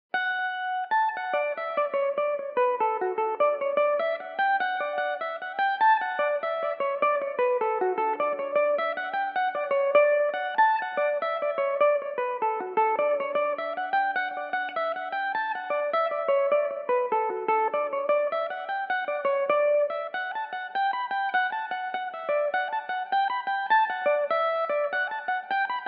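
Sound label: D major belmuse, irish mandolin; Paddy Clancy's; Belmuse EM-190 electric mandolin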